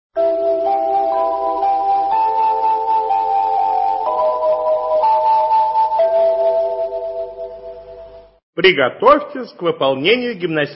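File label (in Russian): позывные маяка зарядка начинается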